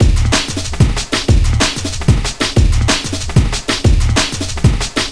whats this break

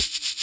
Percs

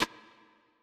After Life Snare

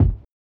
Kicks

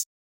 hh, Closed Hats

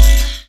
Hit

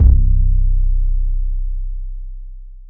Muddy 808; 808s

